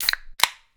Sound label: can open, household; NS Studios - ultra sound collection